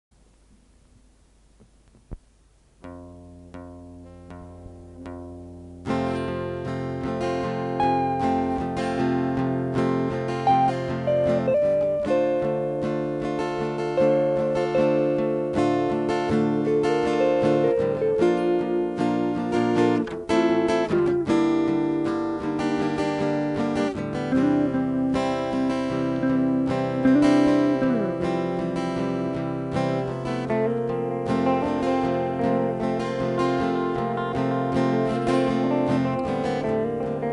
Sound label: Acoustic Demos Plus Bass